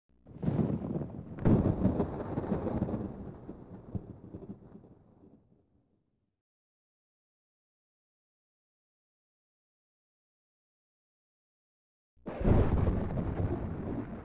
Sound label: thunder theme, storm